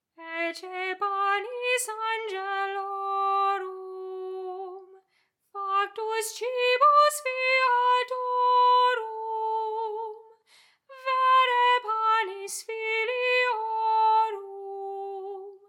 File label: Ecce Soprano